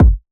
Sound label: kick trappy flat; Kicks